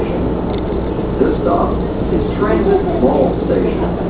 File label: transit mall